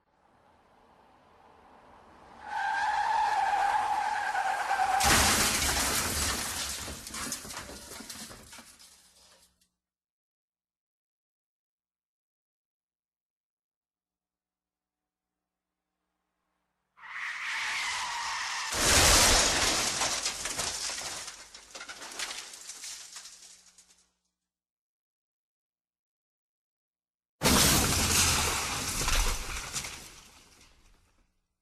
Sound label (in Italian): Suono Incidente di automobile; effetto di suono; sestriere